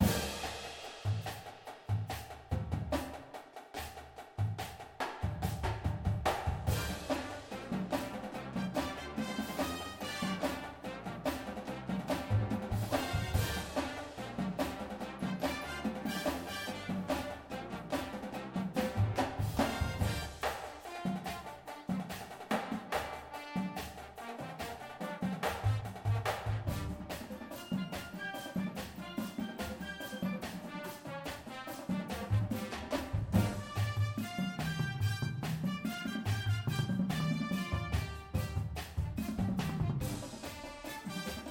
full drumline w music

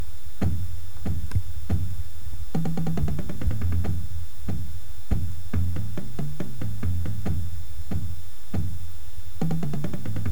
bass, drumline

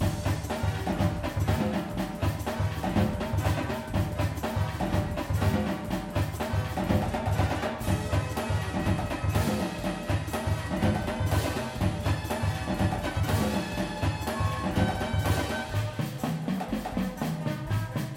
full drumline with music

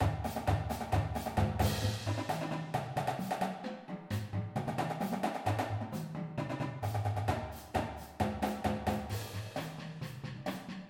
full drumline

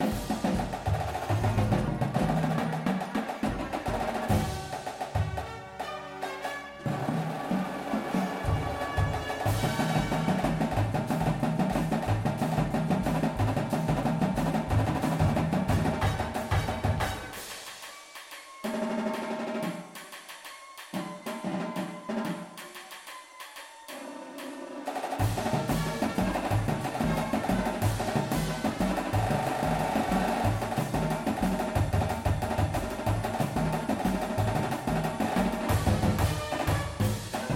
full drumline with music

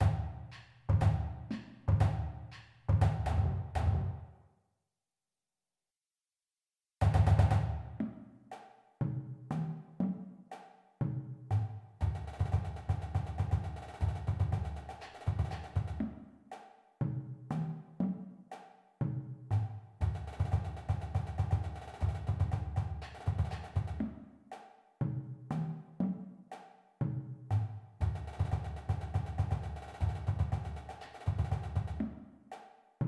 bass, drumline

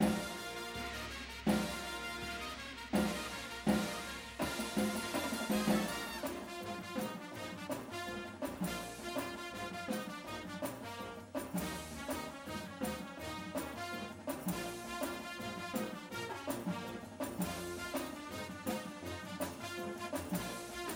tenors w music, drumline

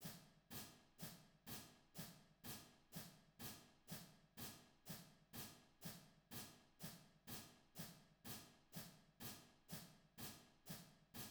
cymbals, drumline

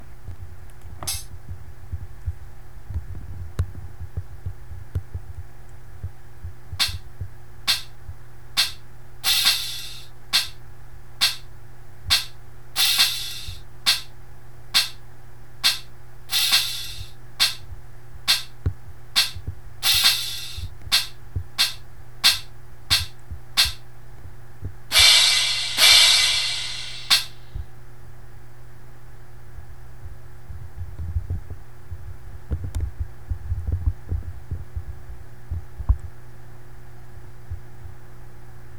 cymbals, drumline

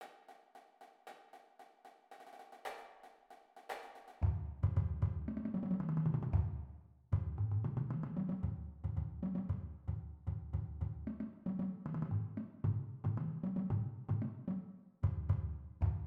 cadence bass, drumline

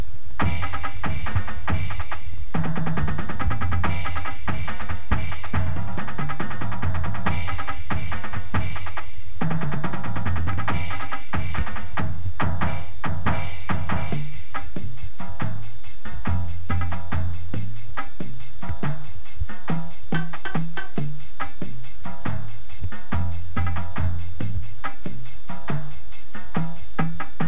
full drumline

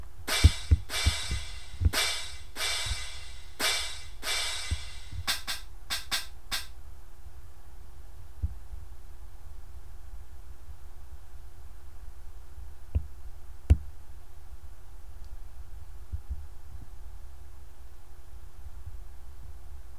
cymbals

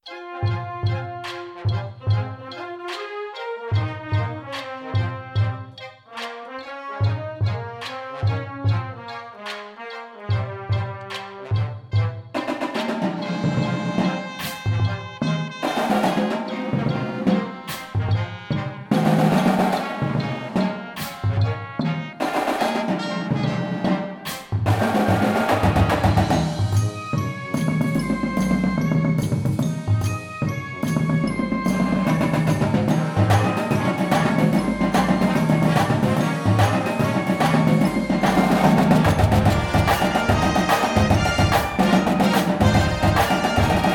full drumline with music

